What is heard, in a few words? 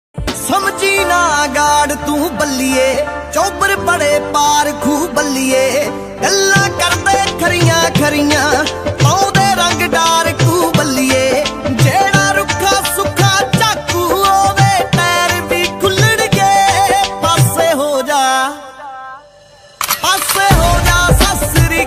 Punjabi Ringtone